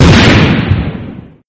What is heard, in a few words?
explode